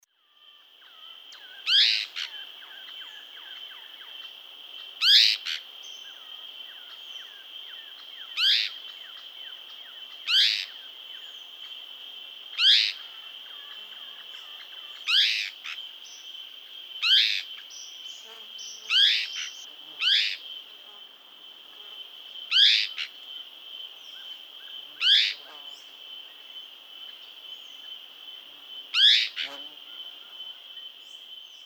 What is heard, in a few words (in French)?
Tyran licteur